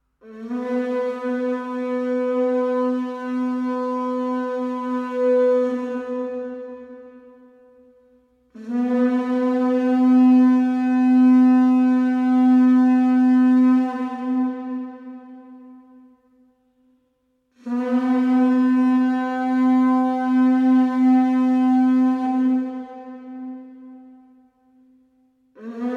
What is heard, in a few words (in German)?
muschelhorn cassis cornuta; Klangbeispiel eines Muschelhorns; Ihr tiefer, durchdringender Ton ist weithin hörbar und unverwechselbar